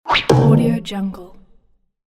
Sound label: boing swish